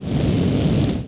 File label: jet; ringtone; Mini Militia